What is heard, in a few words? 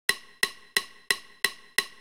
camera timer; SE W510 Twiggy